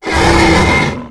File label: pain